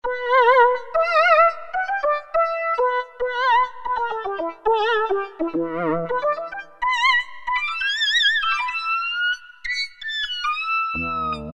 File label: Inv Solo, Roland Alpha Juno-2; Factory B